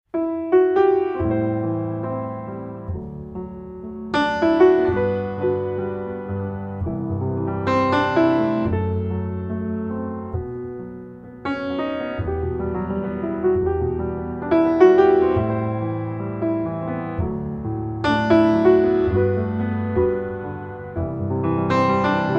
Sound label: Pianist; Hertfordshire